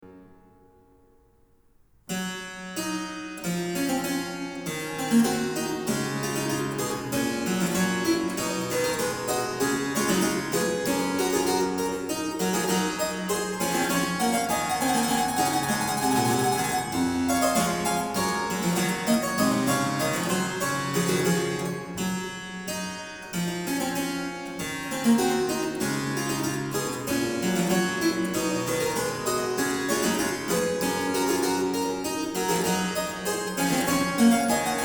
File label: Harpsichord; Bach, J S; Goldberg Variations; BWV 988